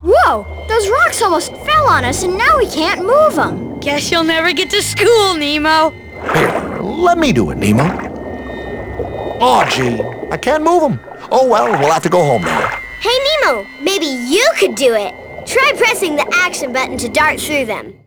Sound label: rock; XBOX; Finding Nemo; english